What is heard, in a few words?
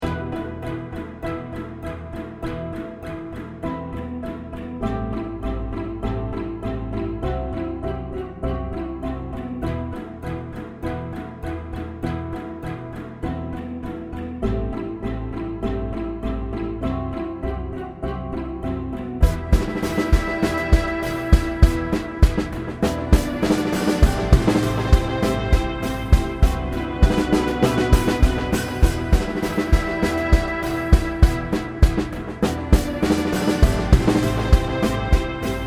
Pizzi drums; AuguryEngine